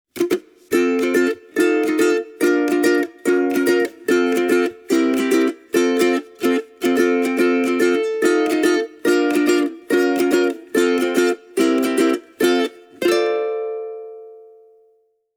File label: mahogany tenor solo, ukulele